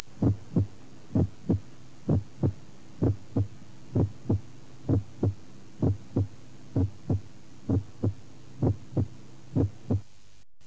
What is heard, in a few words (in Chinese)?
第一心音分裂